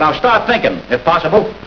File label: lose